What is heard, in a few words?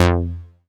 Bass Multi TR F; Vengeance Samples